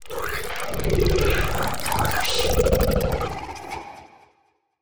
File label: Alien Passying by, Monster Sounds; TheDeclineOfWarriors; Monster Sounds Volume II